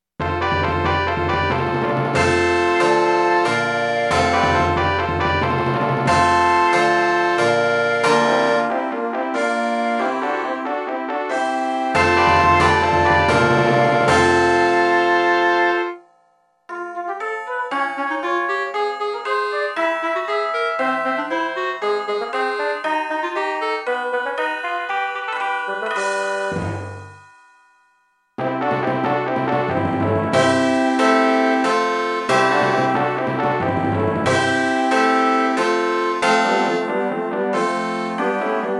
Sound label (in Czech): sveceni varhan intrada